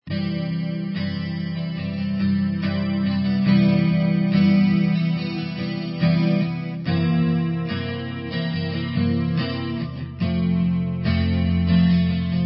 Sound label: C Cmaj7 C7 F, guitar